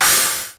Crash Pitched; Zenhiser

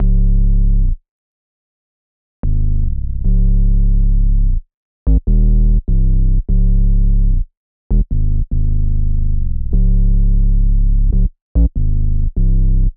148bpm Bass 808 C

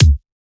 kick E

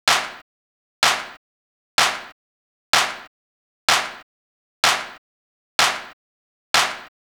Perc Loop Clap Loop 126 C Clap

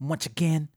Double Vocal Am

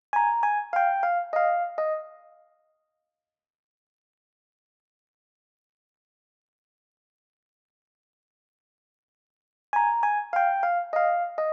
100bpm Piano Break Melodic D-

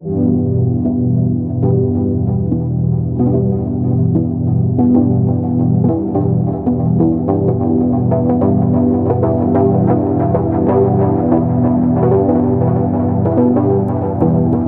Pad Loop Curious Pad Em 95

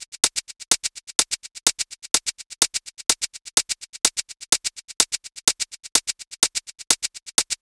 126 top loop simpler

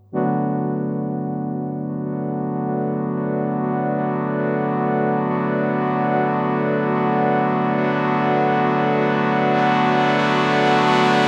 pad one shot brassy pad F-

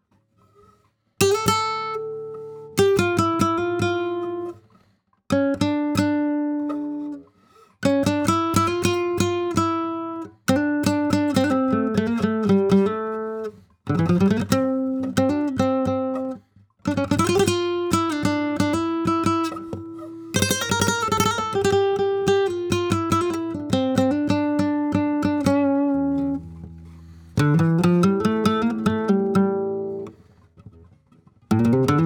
Flamenco Session Two Guitar Dry 140bpm Em

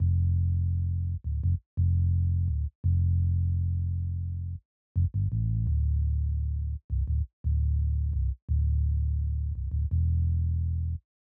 Vinyl Bass F bpm 85

